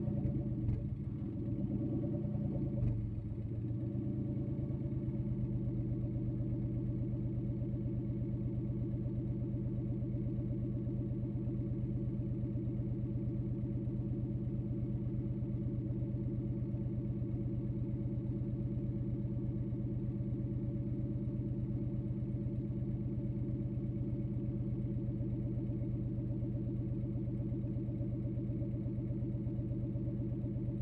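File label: BMW E30 Onboard Idle Various Exhaust, 318i Street Race Modded; 1988; Pole Position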